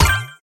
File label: collect; pinball